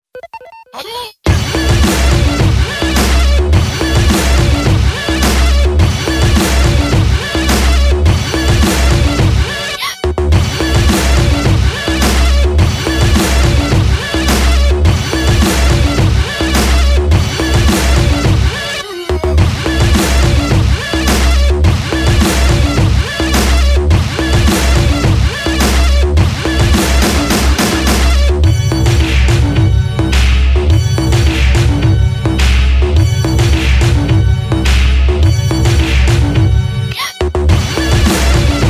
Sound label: 106BPM; Street Fighter Battle